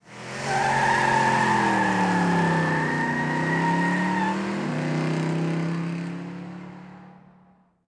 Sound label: peel out, crime